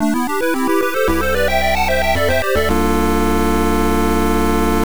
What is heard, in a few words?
Victory; bonk s revenge turbografx 16